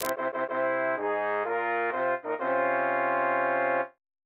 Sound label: Victory Fanfare, MIDI; FFVII; TLON Friendly - Beginning Horn only